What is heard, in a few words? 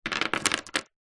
jewelry necklace pebble set down on wood table; household